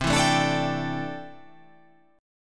C 6th Add 9th C down

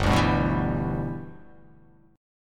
G 6th

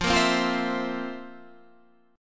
F Major 7th Suspended 4th F, Guitar Standard; FM7sus4 strummed; JGuitar

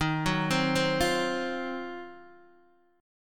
Eb Minor 6th Add 9th, Guitar Open D

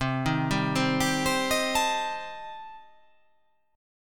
C Minor 6th C

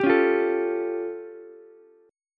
A Augmented 7th A, Ukulele; strummed; JGuitar

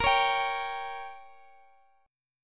Bb Minor 6th, Guitar, Standard; strummed; chord shape; x,x,x,15,14,15

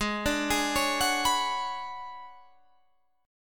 B Minor 6th Add 9th G sharp

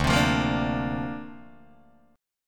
D-Minor 7th Sharp 5th D Guitar DADGAD, chord

